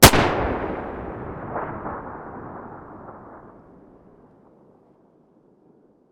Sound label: beretta muzzle mono distant, weapons